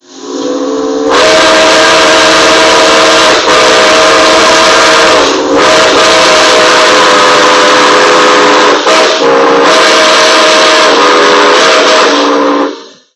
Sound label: horn